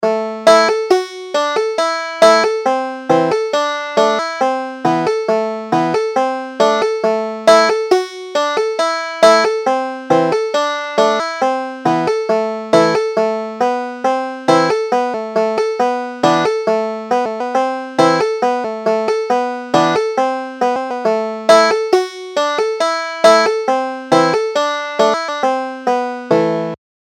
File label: sing we now noel, banjo